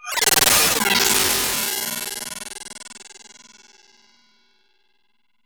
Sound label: FM FX; AKAI S6000 CD-ROM - Volume 6; SYNTH FX